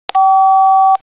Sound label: PHONE, 电话; 设备音效; 办公设备; 特酷声音素材库